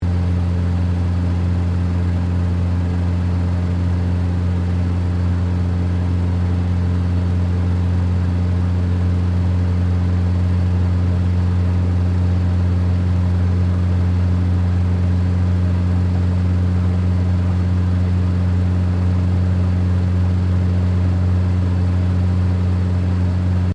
TANK CENTURION SECONDARY ENGINE OPERATE LOOP, British Centurian main battle tank